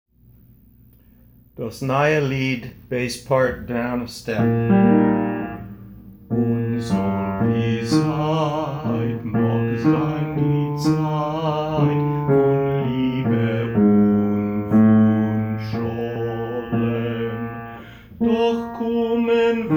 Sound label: Dos naye lid bass, chorus; with lyrics and piano; earlier recording with lyrics and piano